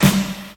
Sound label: classic punk snare sample g key; snare drum samples